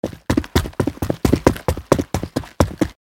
Footsteps on street boots run

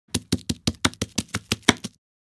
Fast chopping knife cutting board

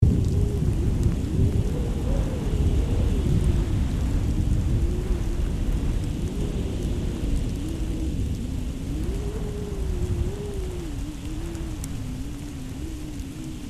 Blizzard icy wind snowstorm ambience loop

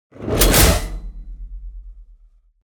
Releasing steam impact; steampunk; gfxsounds

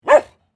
Playful dog barking barks

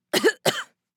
Young woman coughing

